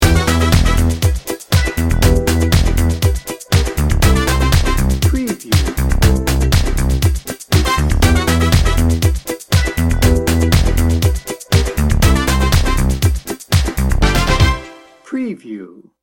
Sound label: happy and festive